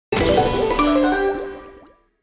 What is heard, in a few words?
Casino slot machine jackpot small win